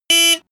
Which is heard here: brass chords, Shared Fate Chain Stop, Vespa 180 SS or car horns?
car horns